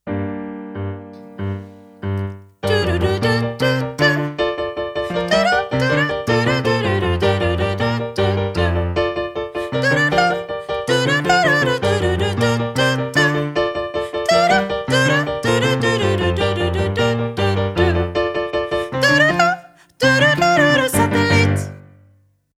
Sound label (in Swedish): sopran